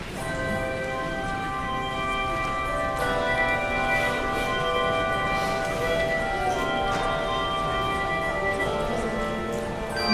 Handbells; It Is Well; Behnke

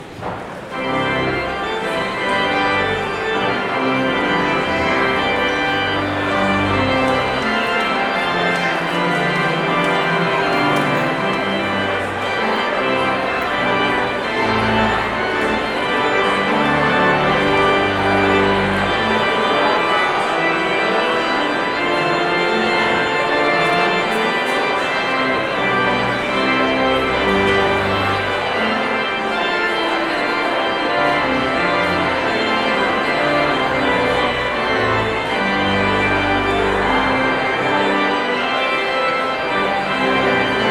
Postlude

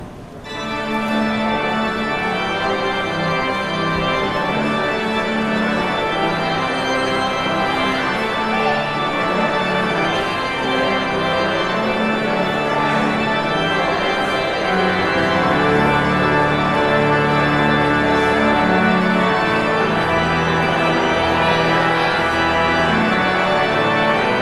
Postlude

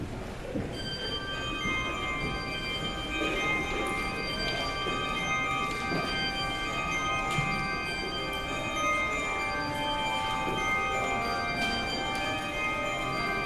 Handbells; Toccata on At the Name of Jesus; Kings Weston

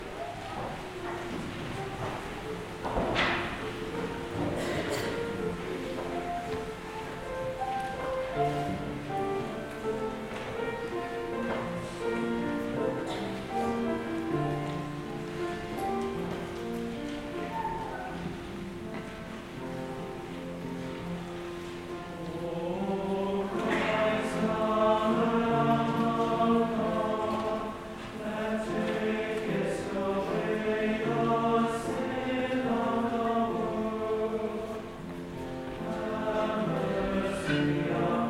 Choral

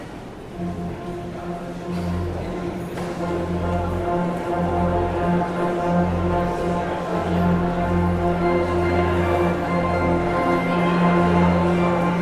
Postlude

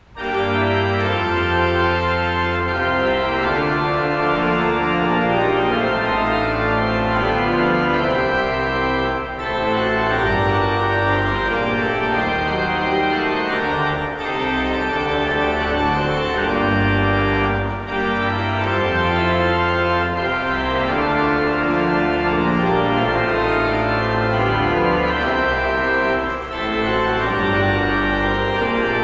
Postlude

